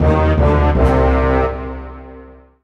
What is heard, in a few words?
Orchestral Brass Negative; Heretic Operative